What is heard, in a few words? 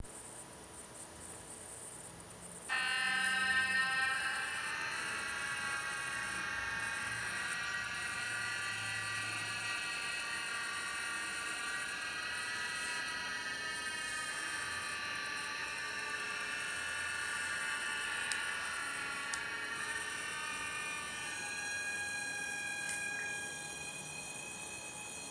rhythm